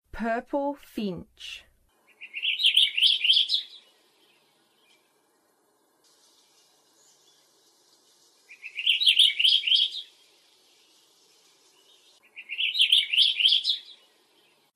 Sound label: Purple Finch; North America